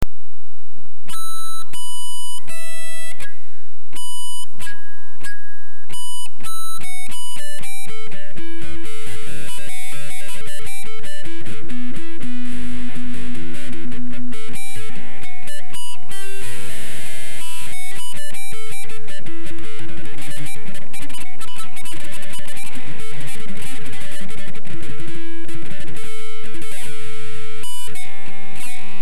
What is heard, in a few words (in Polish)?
flazolety czyste